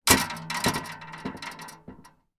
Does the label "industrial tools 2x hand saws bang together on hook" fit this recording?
Yes